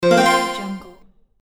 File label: percussive bell video game confirmation menu sound